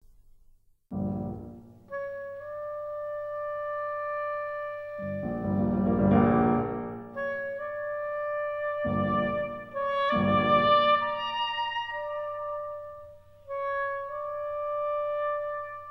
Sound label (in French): hautbois et piano, Andante rubato; Temporal variations; Benjamin BRITTEN